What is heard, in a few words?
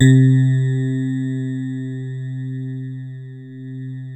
DX Rhodes